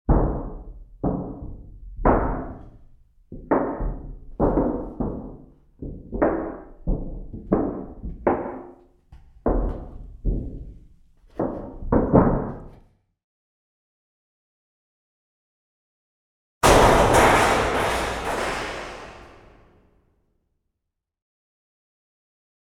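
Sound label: Metal sheet bending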